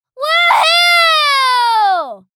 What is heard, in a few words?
Excited vocalization girl kid child